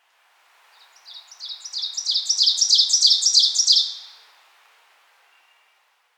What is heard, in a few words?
Ovenbird Seiurus aurocapilla; warblers